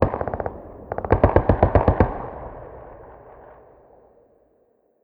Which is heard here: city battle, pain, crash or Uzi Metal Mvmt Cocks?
city battle